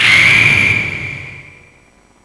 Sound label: bat sound